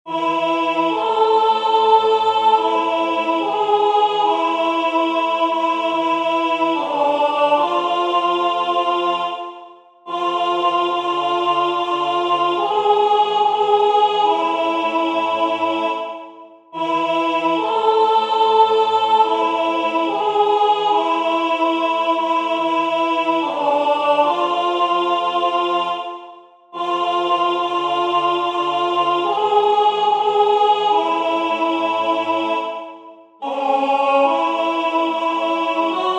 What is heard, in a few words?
alto; Waiheke Island Choral Society